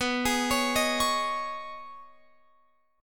B 6th Add 9th B down Guitar Open F; B6add9; arpeggiated

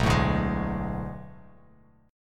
B Minor 13th B down Bass Standard; Bm13 strummed; JGuitar

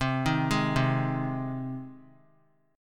C Minor Sharp 5th C 3C,3F,3A,3D; arpeggiated; JGuitar

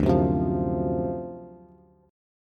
D 7th Sharp 9th, Bass; 10,9,10,10; strummed; JGuitar

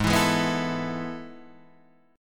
A Major 7th Suspended 2nd

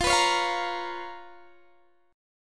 Db 13th F, guitar

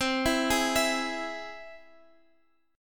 C Augmented down Guitar Half Step Down

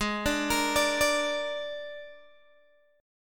Ab Suspended 2nd Flat 5th, Guitar, Open D; down

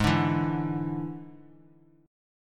D Suspended 2nd Flat 5th Ab, chord

